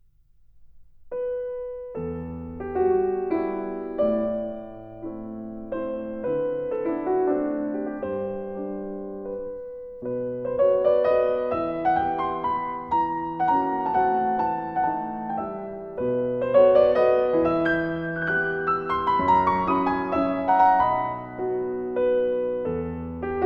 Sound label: Mazurka in E minor; Chopin, Journal Intime (2009)